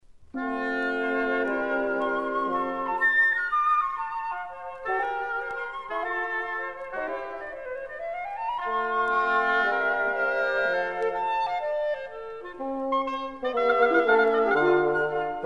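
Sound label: wind quintet; onslow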